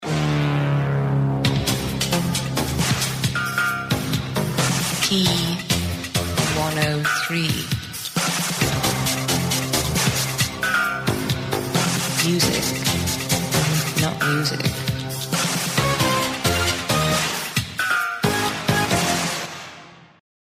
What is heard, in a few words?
music not music